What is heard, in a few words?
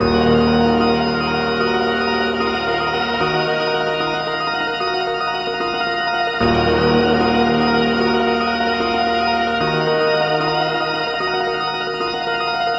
Full D#m 150, Trap; Melody Kits; Ghosthack; Creeps